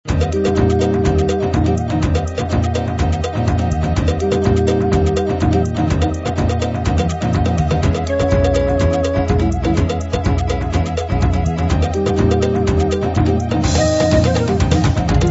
brasil jazz

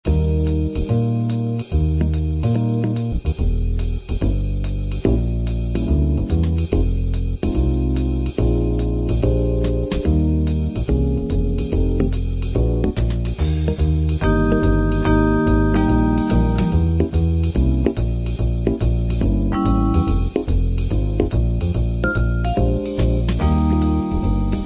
soft jazz

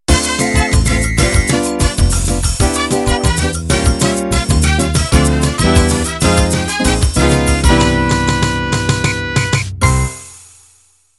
Samba De Sol End

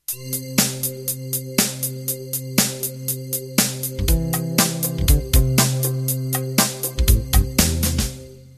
Fusion Intro

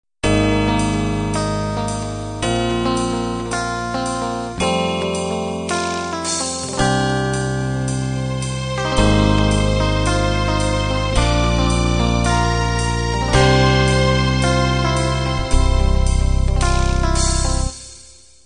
windy beat intro

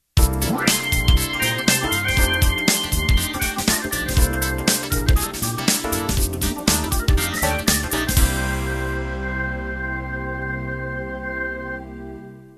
Rubber Funk End

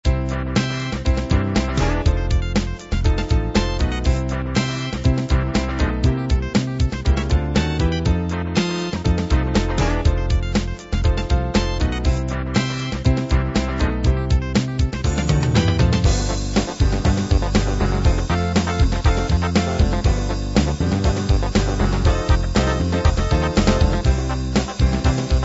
fatback

